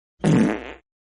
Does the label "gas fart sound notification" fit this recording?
Yes